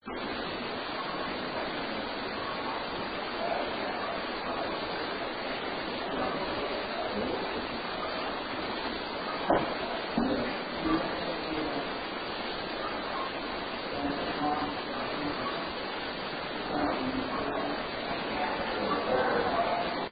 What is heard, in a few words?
Opera House Stage FOOTSTEPS